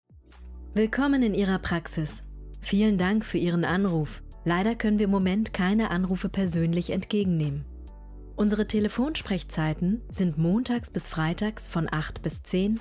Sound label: music